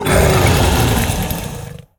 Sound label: Stoneskin Basilisk Death